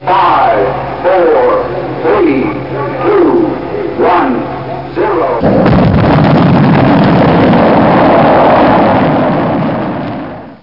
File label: drums